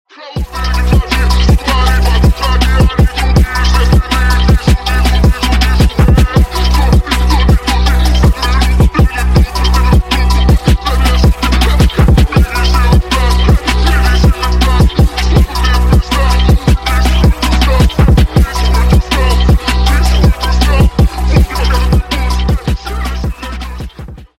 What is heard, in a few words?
phonk